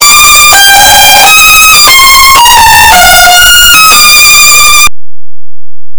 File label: sad violin